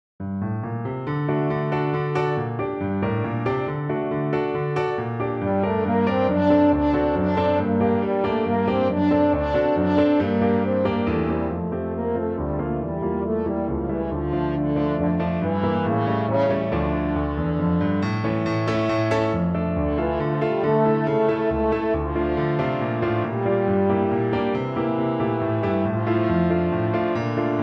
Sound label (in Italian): danza danza, french horn